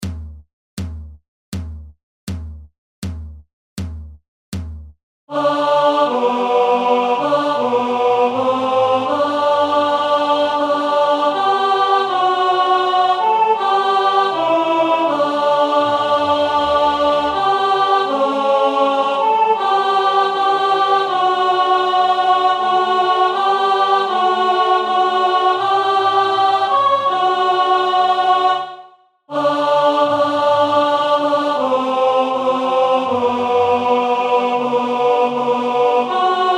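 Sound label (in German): Alt, Raetoromanische Volkslieder; Fontaunas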